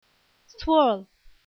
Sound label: swirl